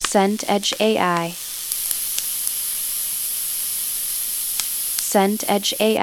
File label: sizzle pan